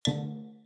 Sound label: click; subwayrunner